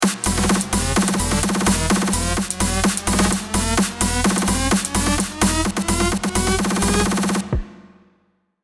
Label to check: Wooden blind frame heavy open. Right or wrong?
Wrong